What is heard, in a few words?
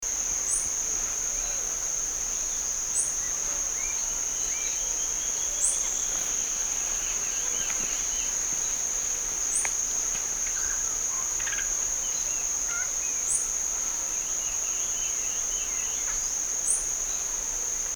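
Speckled spinetail, bird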